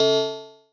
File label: marimba; ゆめ2っき